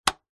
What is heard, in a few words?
household radiator heater portable switch click